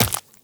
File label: Run Flesh, Footsteps; Warframe; Tenno